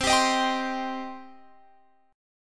C Minor C down Guitar Open F; Cm strummed

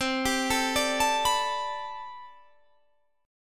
A Minor 11th C, Chord

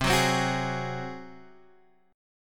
G sharp 7th C

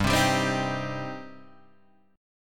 G Suspended 4th Sharp 5th G, Guitar

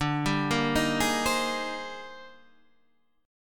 Eb Major 13th D, guitar

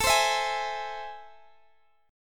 A Minor Major 7th A, Guitar, Half Step Down

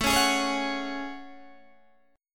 A Major 13th, Guitar, Open D

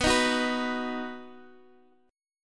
B Major, Guitar, 4F,4A,5C,5G,5C,6E; strummed; 18,18,18,16,x,x

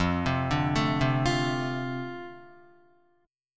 F 6th, Guitar; F6 arpeggiated

